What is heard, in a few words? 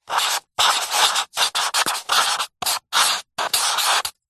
office small marker pen writing on back of paper pad